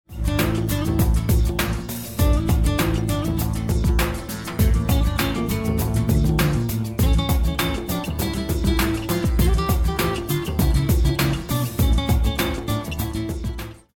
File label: choose team; music kits custom